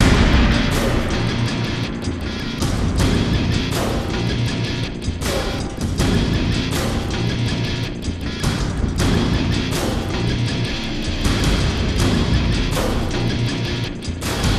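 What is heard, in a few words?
DRUM GUITAR loop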